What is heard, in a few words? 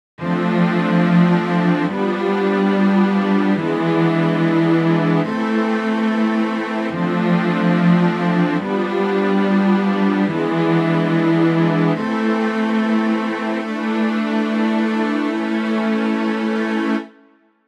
violin